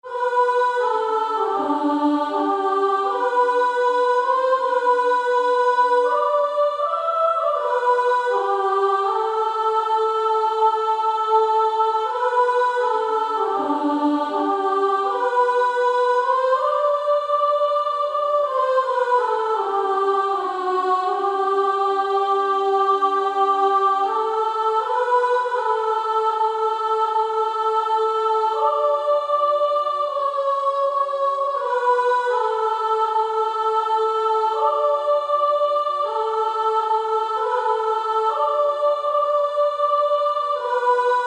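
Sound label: Female Choir, soprano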